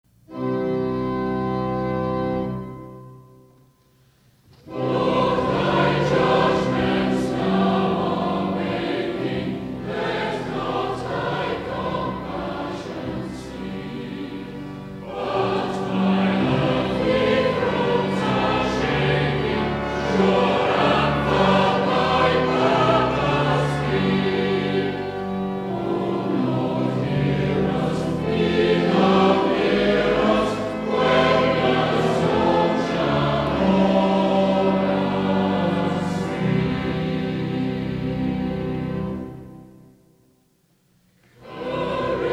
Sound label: Choir, hymns